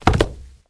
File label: Body Falls On Carpet